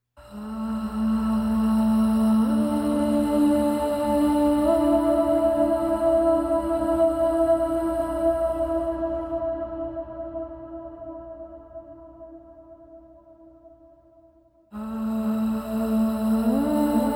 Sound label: vocal only